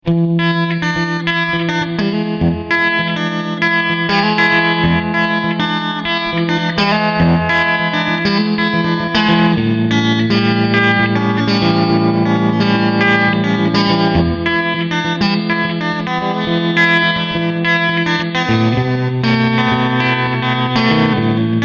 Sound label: Spring Reverb Guitar Dirty; pluginboutique